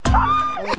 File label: masculinity alert